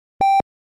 Emergency medical kit heart monitor single beep

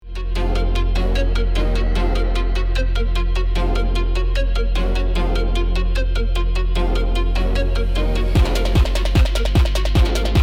Ultimate bass boost

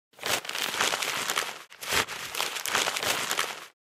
Garbage bag rustling handling

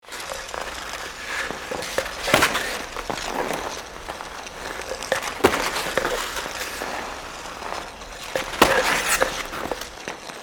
Ice hockey players game contact impact

